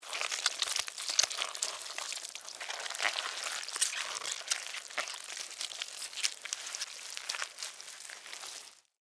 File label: Worm Crawl, Animal, Worm, Crawl, Movement, Slimy, Wet, Long; Insect; Bug; BlastWave